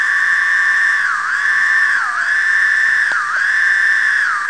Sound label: Periodical Cicadas; Michigan Cicadas; ndecim